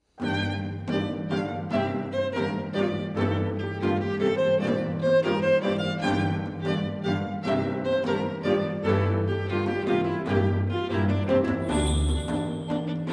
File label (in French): instrumental; danse des animaux rassembles autour d'orphee